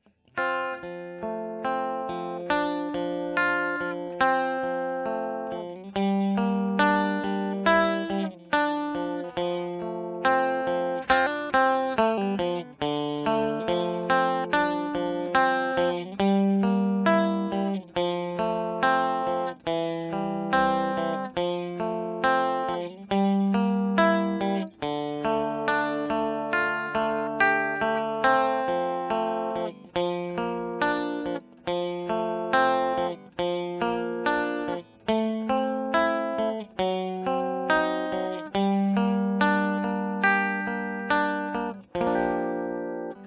E. GTR Royer

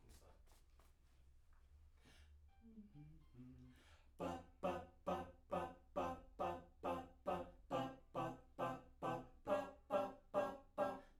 Backs; SCHOEPS

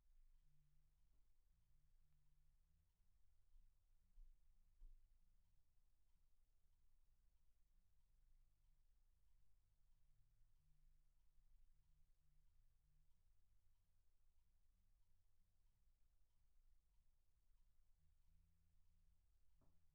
Room, 94bpm

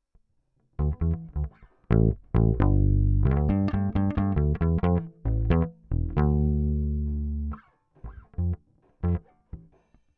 Bass DI

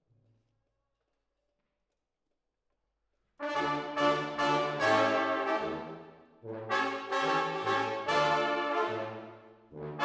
Horns Over

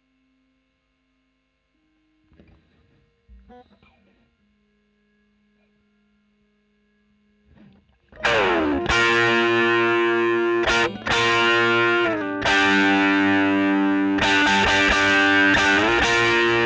E.GTR Royer OD

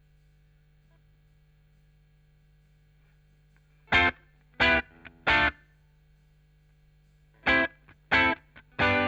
Chorus Gtr

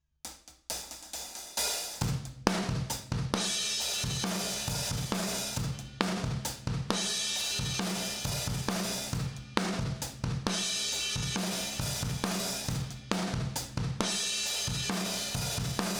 Kit

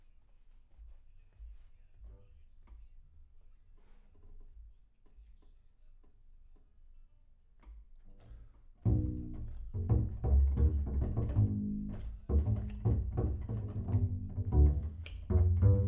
Bass Room

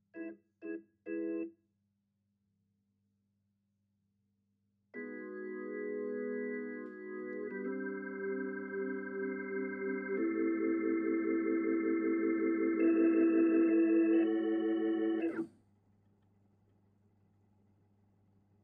Hammond

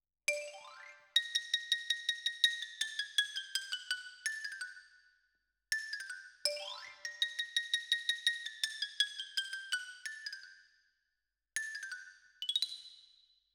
Xylo

